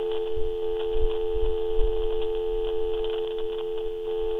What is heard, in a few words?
dialtone